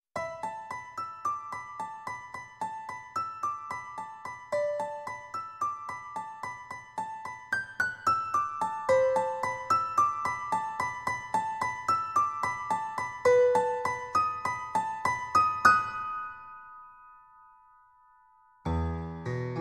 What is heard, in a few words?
piano